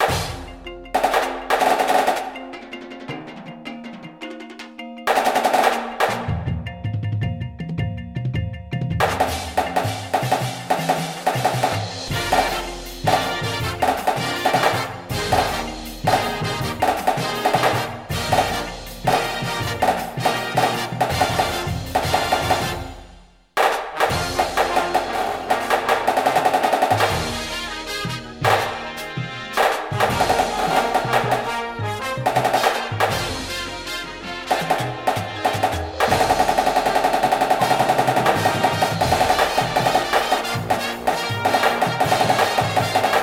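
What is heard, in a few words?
snare with music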